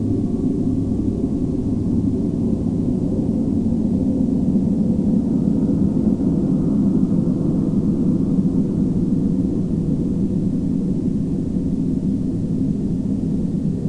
LOOP; Renaissance

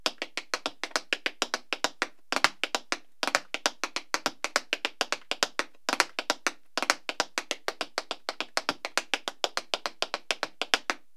tap dance; KABOOM! - Wild and Wacky Sound Software; music

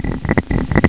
pull; MPC Wizard 3.0; vegas

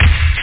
Follow Drum; MarcDrive

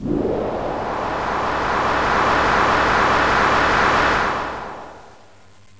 Sea Shore; GMPlay

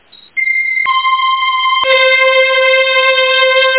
looped string; OctaMED SoundStudio V1; strings

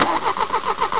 start car

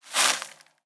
pick ground; Immortal

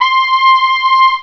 FR HORN C5; Alone in the Dark Demo; Adventure

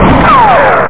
missile

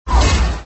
Minimize

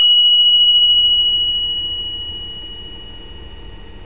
Ping cristal; Chris Marker - Immemory

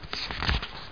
page; Myst Preview

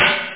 open hat; OctaMED SoundStudio V1; drums misc

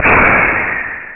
wall; workaholic

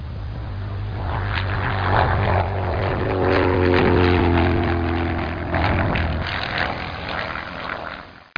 piper; ProOne: Netcracker Netscape Navigator

